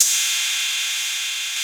CYM CHEEZ, Cheez Cyms

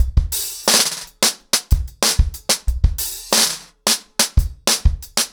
90 BPM, acoustic drums, drumloops

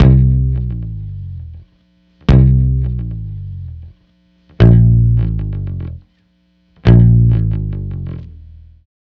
Multi Guitar Bossa Bass-C, 105; Zero-G Kocktail Kollection; Construction Kitz (Lounge)

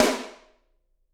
SD, Drums; Bob Clearmountain Drums I

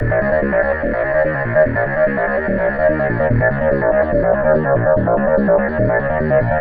LOWDOWN, arpeggios; ILIO; Trance Fusion

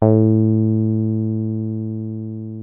303 A2, TB 303, Synthesizer; Hollywood Edge; Giorgio Moroder Rare Synthesizer Collection

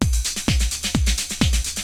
LOOPS, TECHNO; Syntec; Wall of Sounds; TECHNO-BOX

